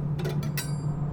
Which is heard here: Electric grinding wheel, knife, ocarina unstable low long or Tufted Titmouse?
knife